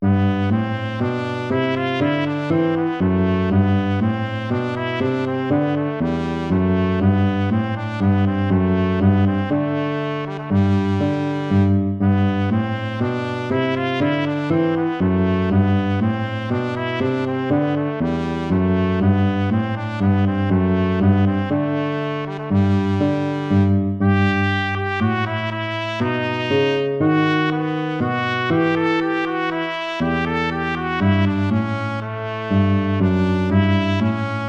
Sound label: baroque minuet major